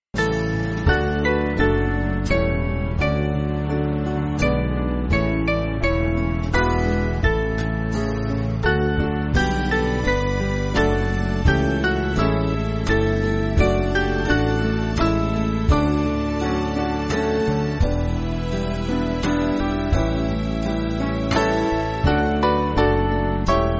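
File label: Band, Backing Files for Churches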